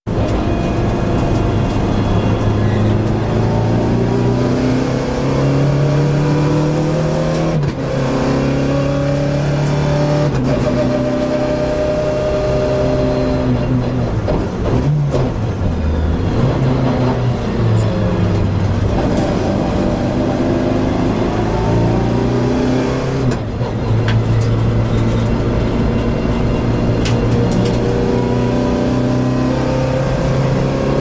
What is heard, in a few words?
Rally car race excerpt; beanstalk audio